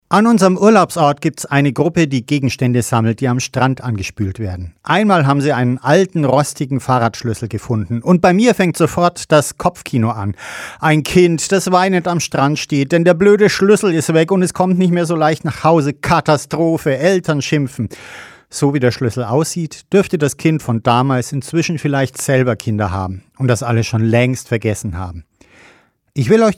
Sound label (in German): gong